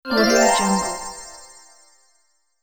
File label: chime achievement